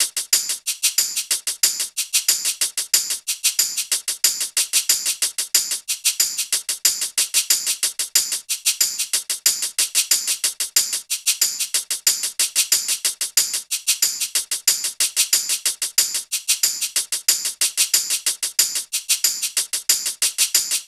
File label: hat 92, Drum Loops, Hat Loops; Eagle; Slate; MIDNIGHT Sample Pack